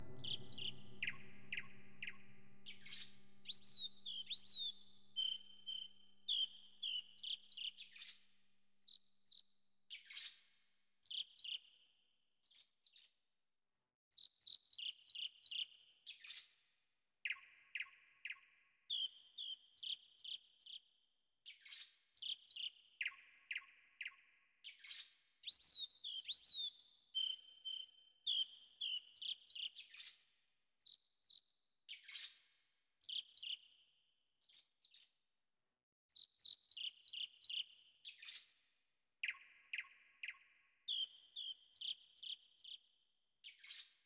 Wildlife Ambience; Pokemon Snap

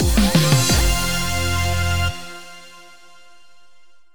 Win Transi Dnb; Cyber Hook

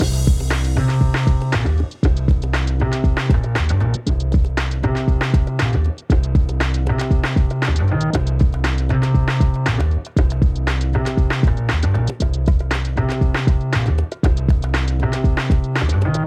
90 hiphop 118bpm; hot lava; ios macos windows

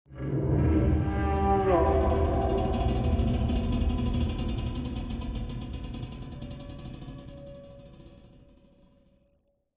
Music Stinger; HDF Ch2

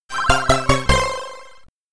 Life Lost (SID Stereo); Vampire; Commodore 64

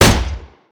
smg fire

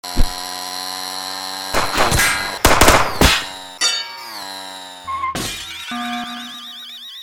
Shield; Stealing The Diamond

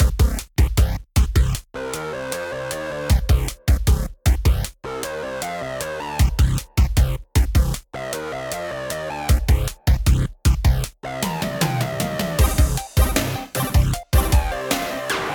Game Loop

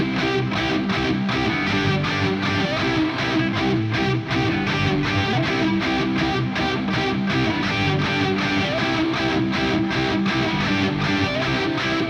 Music Body Guitar; GnomeGnight

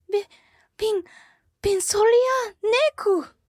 rescue; Bretta

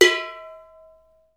tin bell

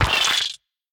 Death Bug; we are the dwarves gamerip 2016

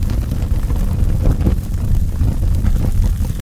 Burn Building

